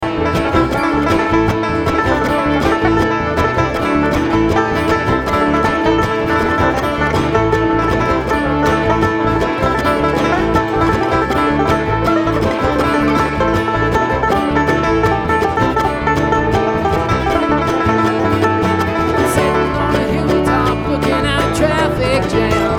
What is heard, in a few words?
Traffic Jam